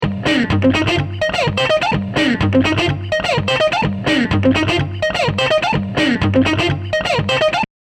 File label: delay